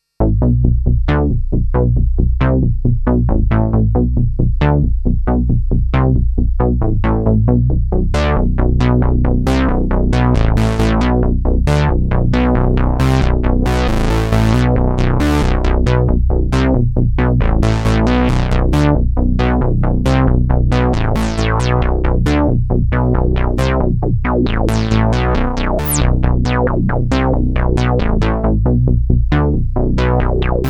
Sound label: MFB-synth2 Bass, German 3 VCO real analog monophonic module synthesizer with step sequencer; Tweaking Cutoff and Emphasis, using other sequenser to show velocity; MFB-synth II; Based on preset Typical (no.01)